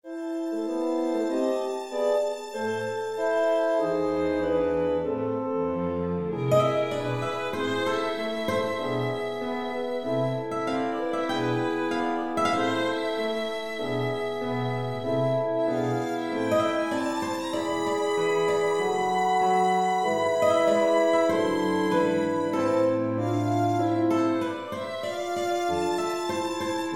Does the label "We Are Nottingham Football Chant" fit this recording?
No